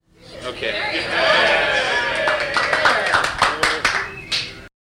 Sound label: Applause